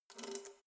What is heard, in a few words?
letterbox metal key pull out soft